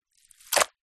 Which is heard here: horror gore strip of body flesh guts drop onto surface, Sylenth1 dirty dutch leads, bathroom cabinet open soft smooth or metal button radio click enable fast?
horror gore strip of body flesh guts drop onto surface